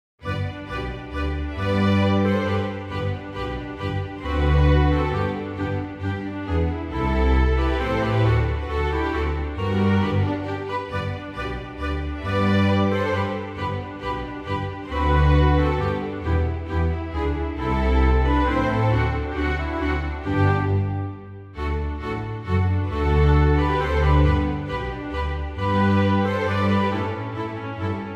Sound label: Menuet in G